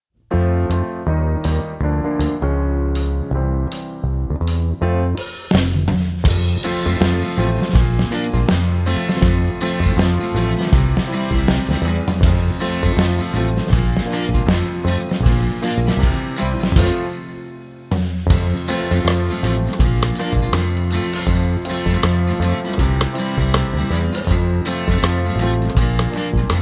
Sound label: Alleluia (karaoke)